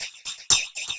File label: TAMB; Zero-G; Chemical Beats